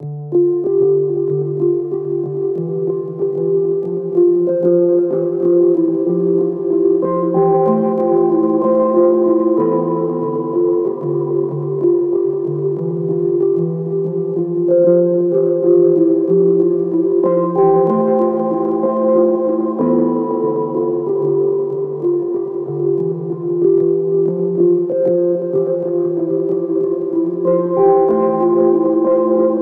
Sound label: syntwave Cmaj 94bpm